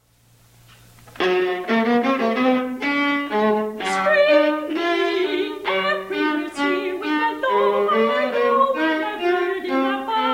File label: songs for soprano and viola; roosevelt; five songs for soprano and viola; spring may